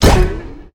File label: Hit; Smite; god Kumbhakarna